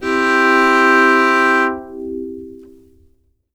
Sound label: C4 chord